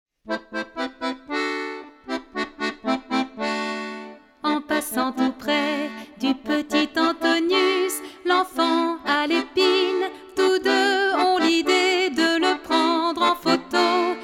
seconde voix; Barouf au musée

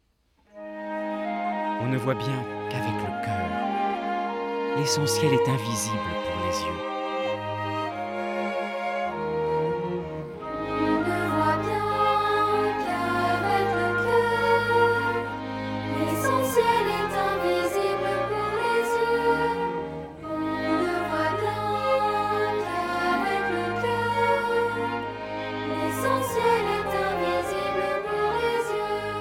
chant; petit prince

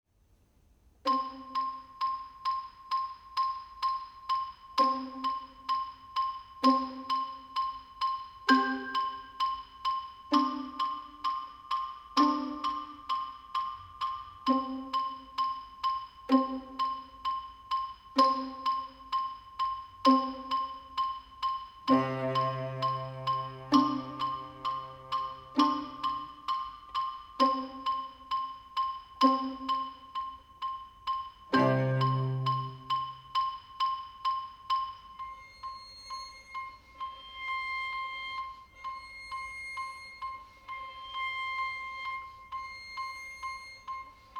orchestre; petit prince; allumeur

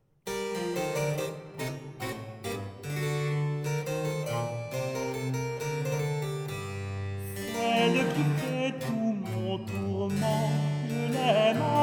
baroque couplet tenor; airs sérieux et à boire

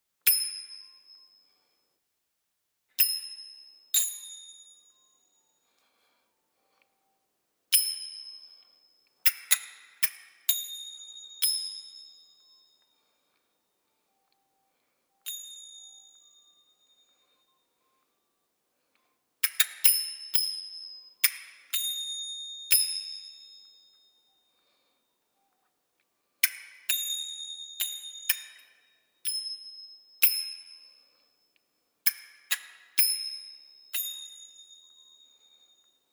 crotales; celle qui fait tout mon tourment airs serieux et boire h450; chantons baroque

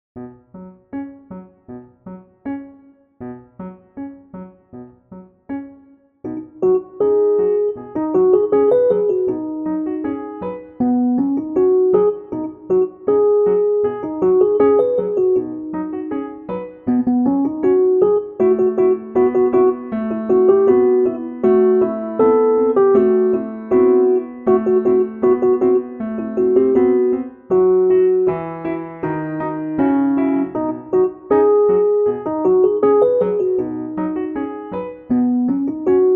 vieux poirier instrumental et melodie

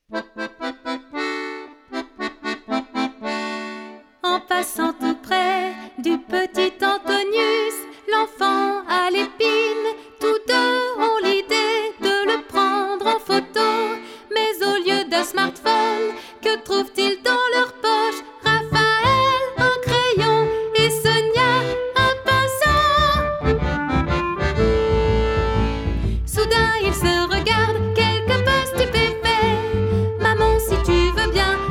voix principale; Barouf au musée

